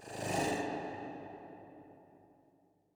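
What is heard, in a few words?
terrain ambient guttural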